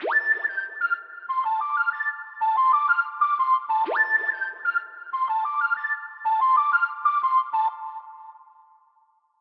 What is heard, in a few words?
strange widdly synth spring verb; SYNTH AND ELECTRONIC